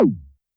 Tom Synth, YAMAHA RM1X

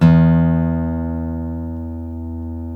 STEEL E, FOLK STEEL Guitars; Spectrasonics; Hans Zimmer Guitars VOL-1

